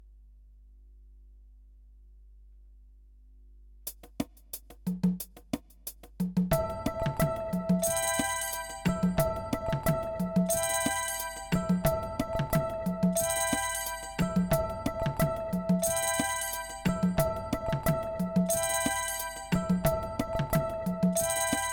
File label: backing track